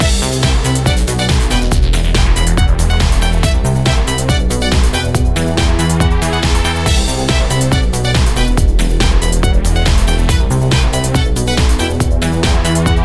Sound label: Dance Room, ringtones; NGM